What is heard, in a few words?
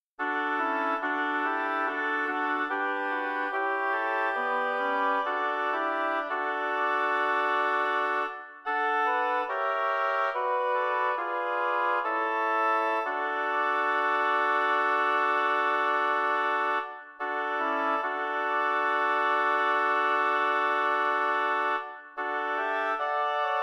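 lyrical oboe quartet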